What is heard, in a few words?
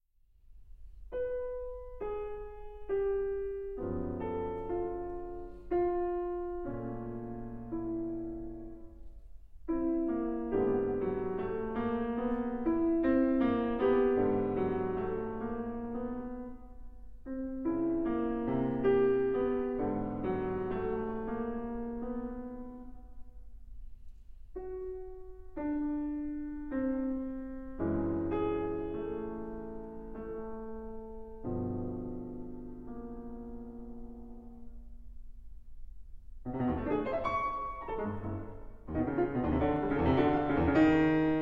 Piano Pieces Mässige Viertel; 3 Piano Pieces, Op. 11; Schoenberg